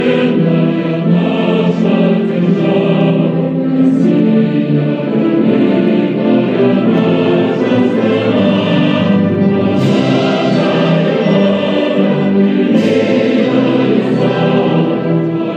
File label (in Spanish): himno ruso